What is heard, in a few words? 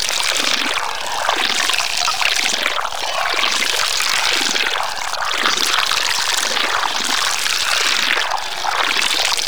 Door Ink Blob Loop; Gameplay; Warframe; Shadowgrapher